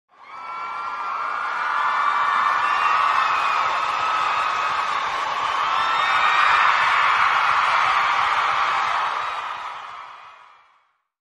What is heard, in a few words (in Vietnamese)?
tieng het len cua khan gia